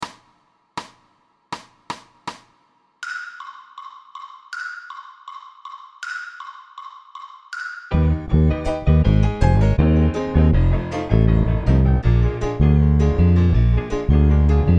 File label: Jazz DRUMS Play Along Alternate Style; LMEA All-State Jazz